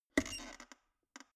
plastic water boiler open lid soft

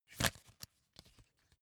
magazine flip back fast

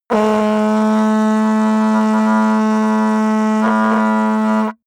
mouth fart

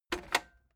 cassette eject click fast heavy

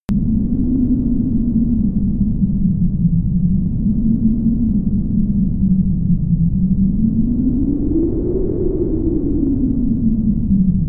dark wind loop

